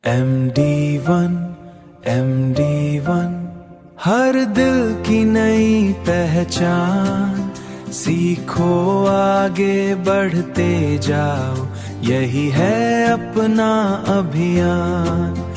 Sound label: Acoustic Ringtone; MDI ONE